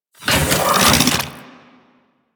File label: Railgun Charge Up; Weapons; Warframe; Archwing